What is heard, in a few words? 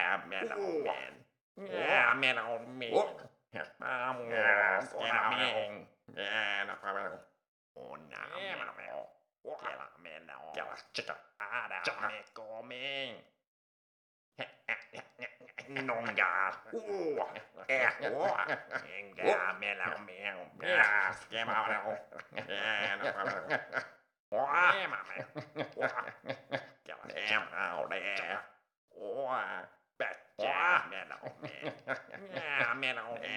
Thief crowd; Hollow Knight: Silksong